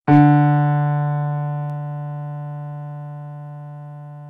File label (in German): klavier d4